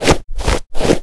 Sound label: Snowy Wolf Stand